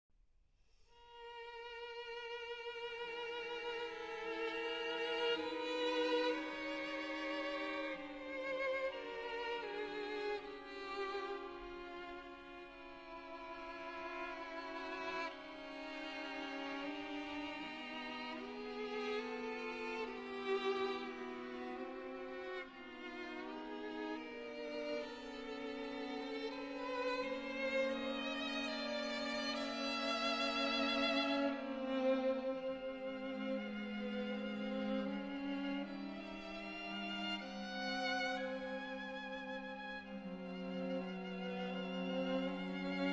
String Quartet No. 6 in D Mesto - Burletta Moderato